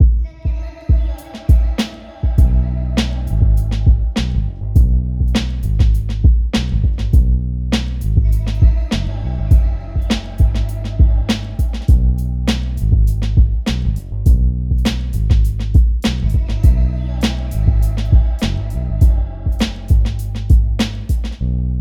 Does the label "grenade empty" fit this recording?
No